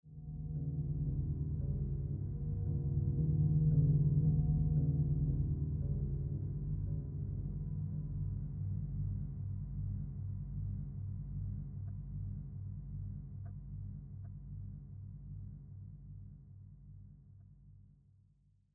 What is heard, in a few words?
Drum and Harp; Drum and Harp Mood 7